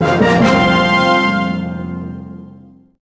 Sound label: arena end